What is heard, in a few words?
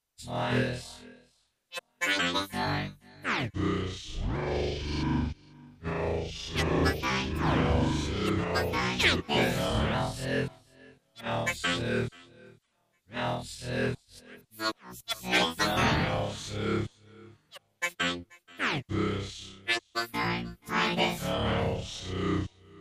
juno g internal patch